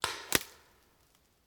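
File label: Paintball Gun Impact; Sound Ideas; Guns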